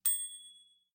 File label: correct